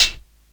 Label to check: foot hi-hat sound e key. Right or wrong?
Right